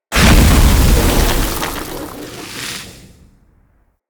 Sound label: Blind Sand Burst; Powers; Fey; Saboteur